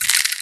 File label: spider spawn; arachnomancer